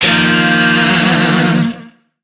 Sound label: Shawzin Blues Chord; Warframe; Lizzie Shawzin